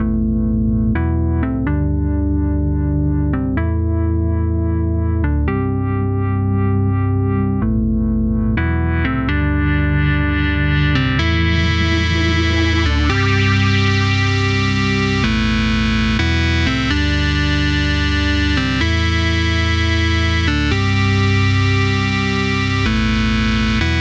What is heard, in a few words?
pulsedrive; Loops; Electronic